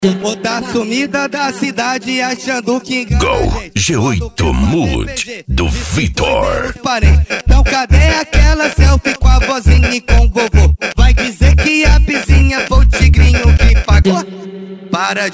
funk ritmado

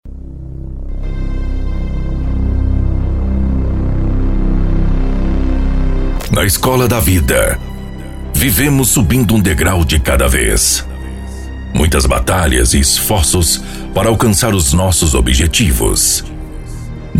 mega bass; carreta abusada do juninho

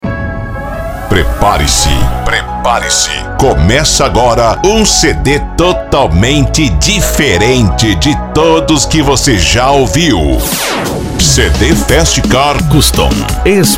especial reggaeton; fest car customs

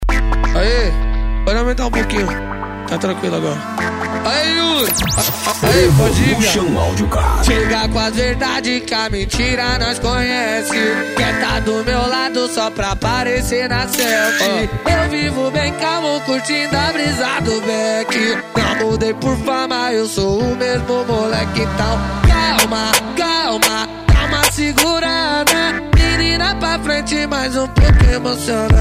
funk evolution audio car

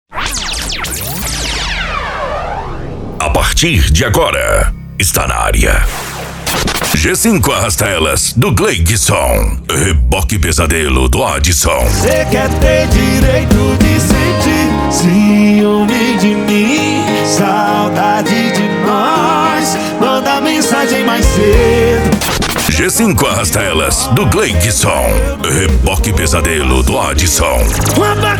sertanejo e forro

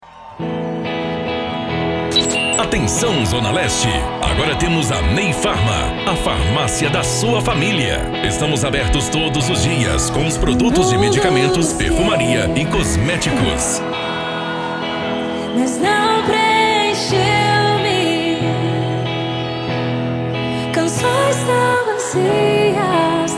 gospel

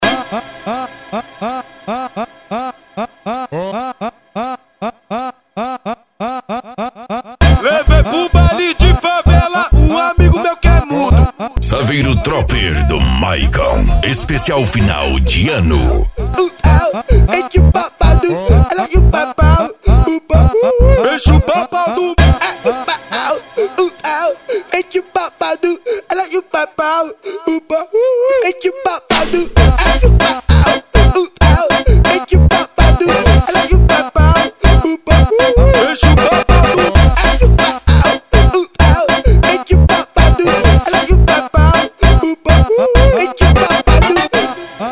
funk agressivo funk

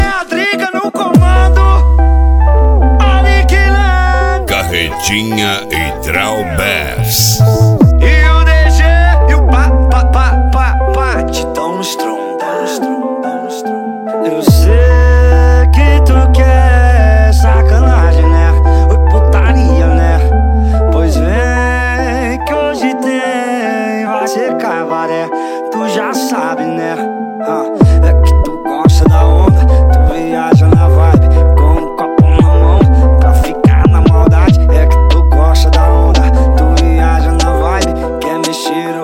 piseiro

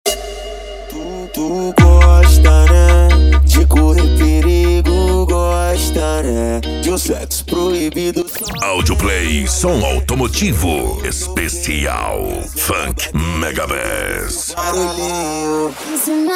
esp funk mega bass

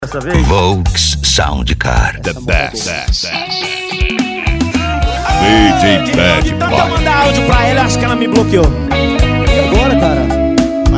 sertanejo e pisadinha; cd volks sound car

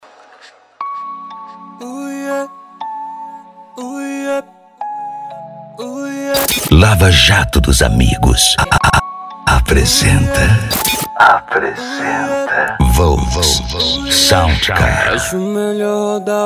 sound car hip hop nacional; cd volks sound car

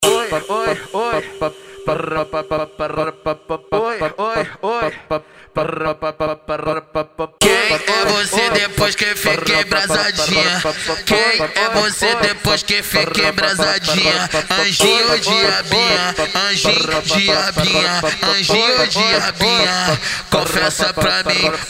funk agressivo ritmado e funkadao

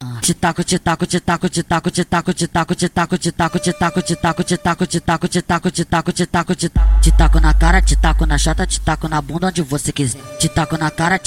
mecanica em geral edicao funk

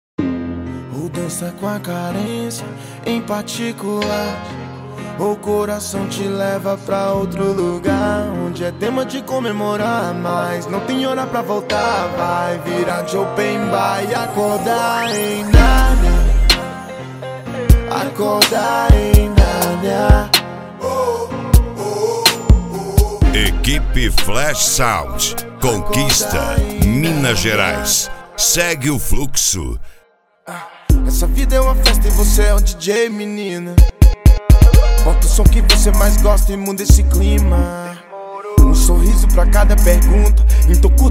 rap; cd rap flash sound

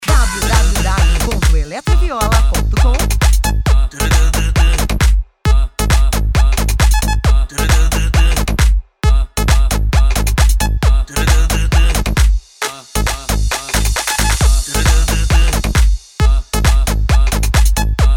eletro e viola especial de eletro funk

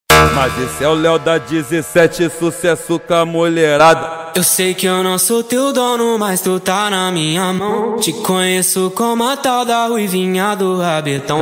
funk e sertanejo

